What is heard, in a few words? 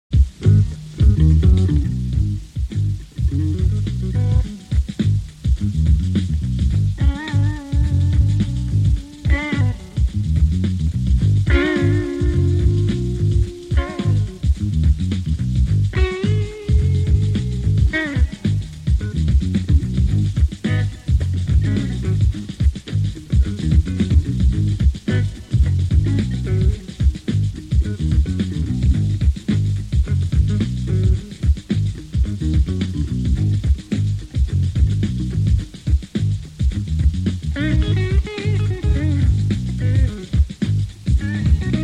Funky Blues Jam